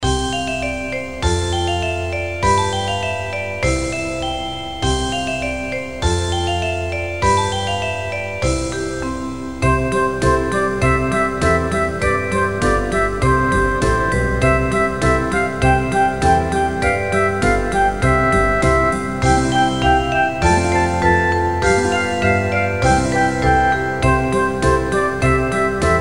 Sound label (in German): zirkuslieder playback